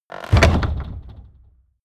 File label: door wood close